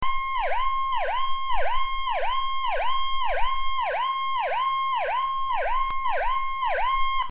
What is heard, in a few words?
Warble, siren; whelen